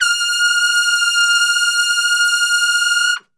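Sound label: F 4 trumpet straight, brass; Best Service; Brass Super Section; TRP STRGHT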